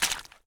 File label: Footstep Puddle